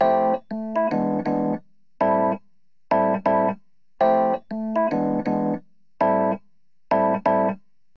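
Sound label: wet epiano, Multiband neural audio effect; Demo without amplifier; Wet-NoAmp; prism distortion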